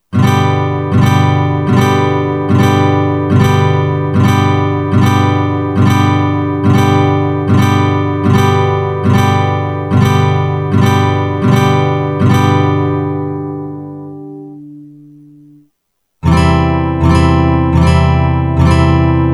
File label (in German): G Akkordfolge